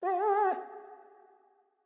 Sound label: Dog Bark; Spelunky